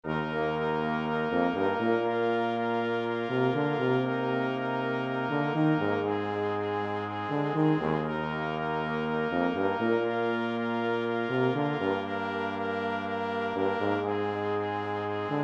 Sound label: brass quintet